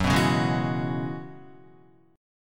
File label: F sharp 9th Suspended 4th F sharp Guitar; strummed; JGuitar